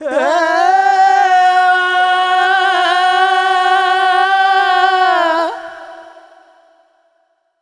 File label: Bhangra, Vocals Male, World Pop; Sonic Foundry (Sony Creative Software)